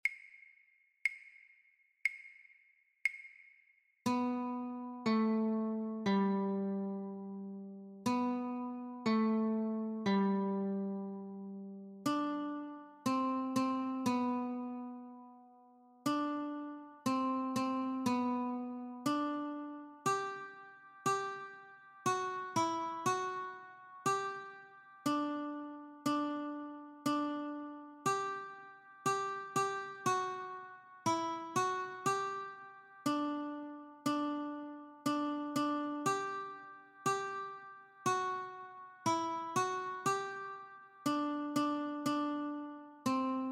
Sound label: Notes Only, guitar